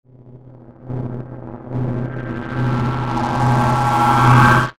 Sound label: Whoosh Slow Long Delay Pan; Blastwave